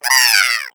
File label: egg bot tractor beam; player; Portal 2